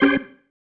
Stab, Organ, One Shots

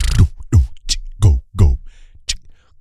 MACHINE GUN, Vocal Blues Soul; Spectrasonics; Planet

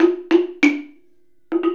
Slit Drum

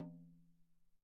Tom, EMU PX-7 Drum Machines

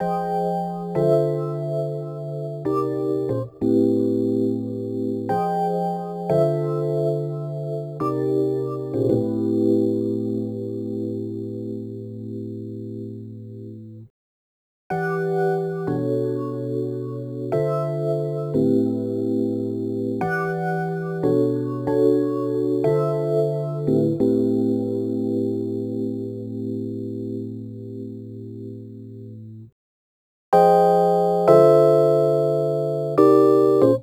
Rhodes Attacks; Ueberschall

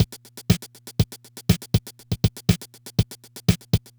Loop, dd-5

